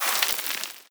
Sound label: debris sand, bullet impact debris